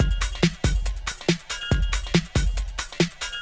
Loops BB 140, BreakBeats; Vengeance Samples

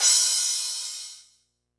Cymbals crash; Vengeance Samples

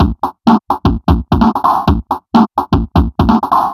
House Beat, 128 BPM

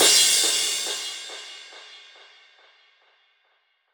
Crash 138BPM; Drums & Perc; Gminor

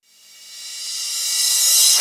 reverse, FX; Vengeance Samples

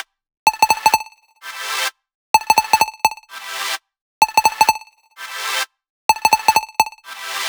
128BPM Melody; Vengeance Samples; Breaker Kit

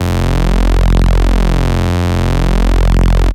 Bass Cuts; Vengeance Samples; VES1 Instruments

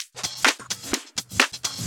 Electro Loop 128 BPM; Vengeance Samples

